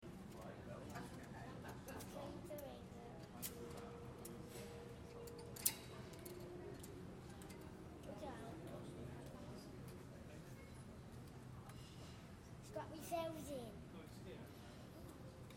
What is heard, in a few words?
loud bell